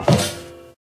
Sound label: metal grate, footsteps